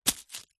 single footstep hard tread on dried leaves

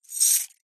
foley keys large bunch prison guard grasp in hand

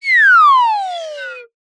cartoon slide whistle double pitch descend

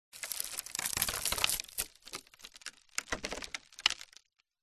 crashes wood; Audio Mangler

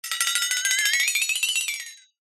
cartoon flexatone

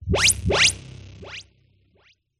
Blasts Synth Delayed Oneshot; sound spark

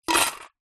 foley coins down in metal container

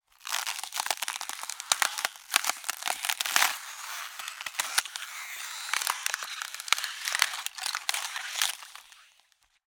nature ice crack

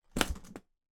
foley flightcase down on bed

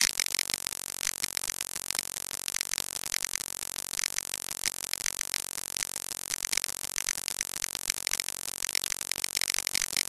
voltage crackle glitch loop; the sound pack tree

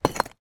foley broken bricks movement

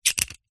stapler pocket sized close loader; office

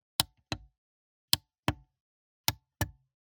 Buttons Switches; soundbits

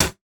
human spit; sound effects one

